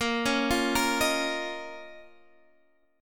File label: D sharp 7th Suspended 2nd A sharp